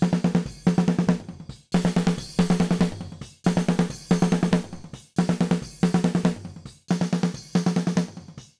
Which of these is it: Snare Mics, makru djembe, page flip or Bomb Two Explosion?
Snare Mics